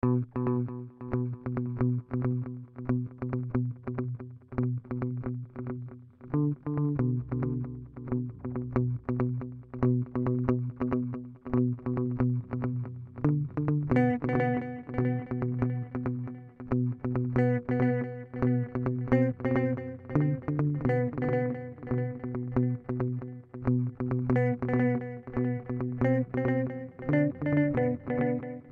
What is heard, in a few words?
Tempus Delay 3 Heads; Specular Tempus